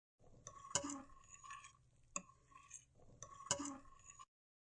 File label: Metal Spoon, Metal spoon scapes metal pot.; Metal Spoon Scrape; Kitchen; Household